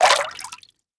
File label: Bird Exit Water